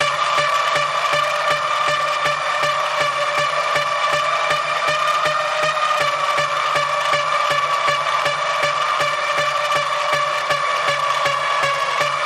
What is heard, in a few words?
Paranormal Panning Pulse D, Key of D